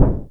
DRUM, ANALOGICS

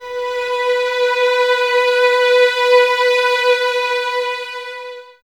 String Violins

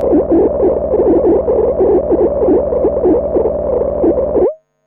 Odd Modular; radiosonics

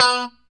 POP B3, STRAT guitar; Spectrasonics; Hans Zimmer Guitars VOL-1; JC-STRAT POP

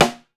GRETCH SN, BRAZIL SNARE; Voice Crystal - Steve Reid Definitive Percussion

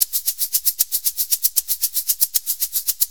6-8 Shaker, Perc Loops; Drum Tools

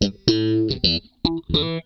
FAZE PLUK, Funk Guitar; 90 s Sample CDs; Zero G

